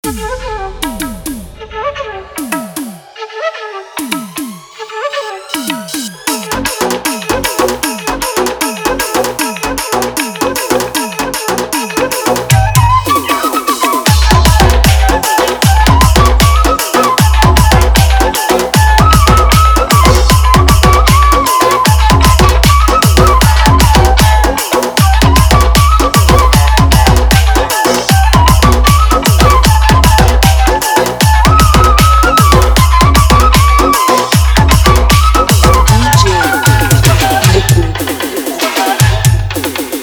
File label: Flute Song Tapori Mix